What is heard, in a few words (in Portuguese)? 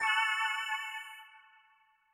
Entrando na fase